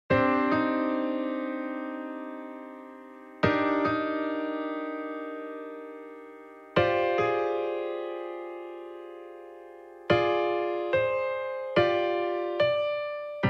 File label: karaoke instrumental